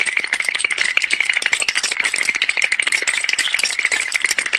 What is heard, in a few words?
shivering dog meme